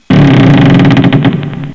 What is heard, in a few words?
Door; Savage; Buildings; Playground; Fence